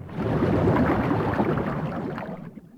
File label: Waves